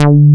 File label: JNO SPIK D4, SPIKE BS